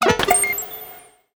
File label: cyberincident, message tones